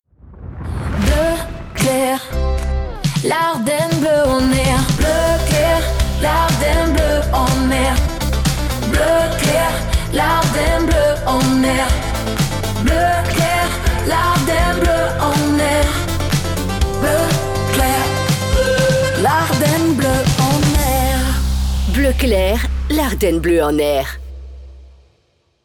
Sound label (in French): Jingle Chante Theme; BleuClair